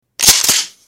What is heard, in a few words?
pump action shotgun